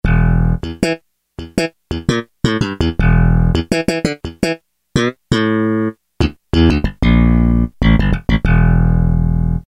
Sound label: Slap Bass, Roland U-110; Factory Patch